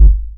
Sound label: Band passed 303 deep, baesse